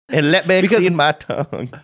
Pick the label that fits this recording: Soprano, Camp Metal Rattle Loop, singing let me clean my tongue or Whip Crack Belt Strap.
singing let me clean my tongue